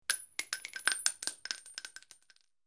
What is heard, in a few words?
Multiple Shells Ejec; mvmt